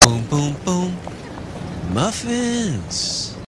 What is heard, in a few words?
boom boom boom